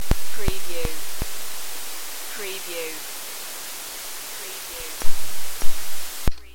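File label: Handheld CB Static Snow; Cobra Hanheld CB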